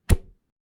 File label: Bow Release Bow And Arrow